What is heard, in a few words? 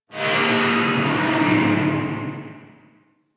Galleon Metal Screech; Ambience; Warframe; Grineer Galleon